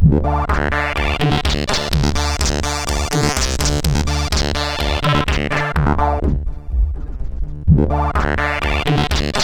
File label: Crowd, CSG8580R5; sid; hvsc